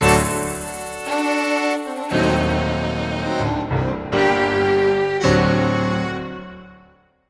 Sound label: Tango; mass effect 3 citadel gamerip 2013; Silversun Strip